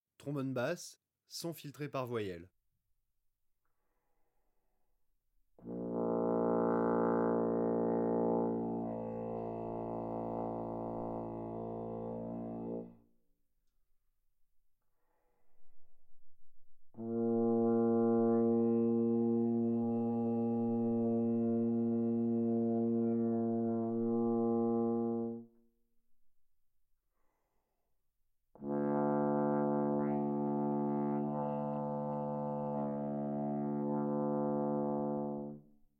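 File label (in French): basse sons filtrés par voyelles, trombone; Textures; Sons complexes faibles; traite orchestration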